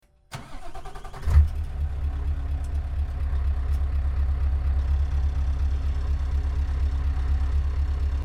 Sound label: Scania V8 Sound, trucks